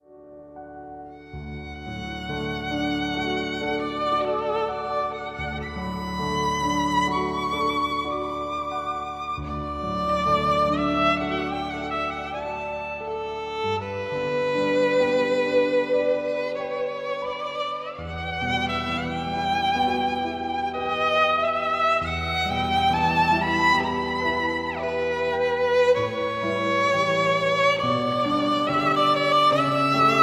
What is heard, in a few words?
Pop violin